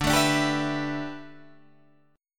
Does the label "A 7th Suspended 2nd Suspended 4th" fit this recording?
Yes